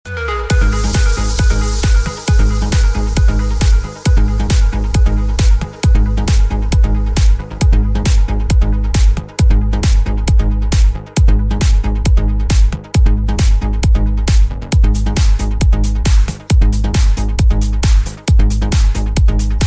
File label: samsung tone ringtone